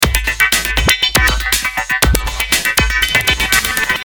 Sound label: Floating tone; Samsung; GT-B5310R